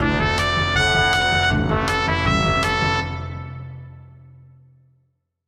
jingle sports highlight

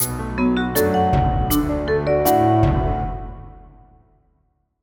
jingle tech ident

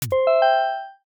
small discovery spark

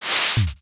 whip pan close

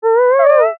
cat meow soft

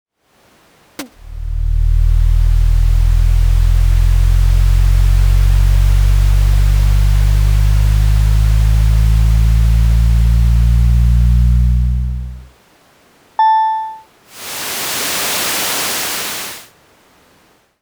elevator inside

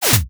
transition digital snap

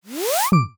reverse suction pop

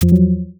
mic off

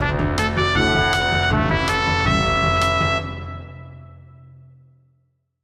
jingle news open

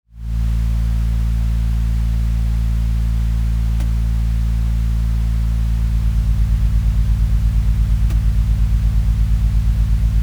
room air conditioner

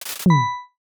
price tag flip

